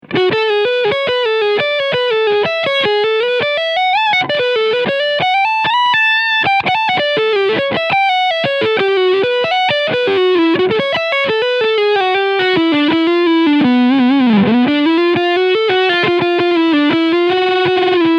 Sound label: TELE DELUXE Lead Sound Front PU; Fender AV II 75